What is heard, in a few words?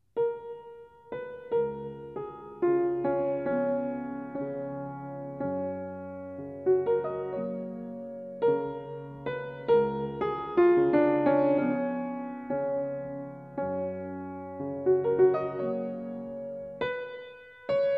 Chopin Nocturne Fis-dur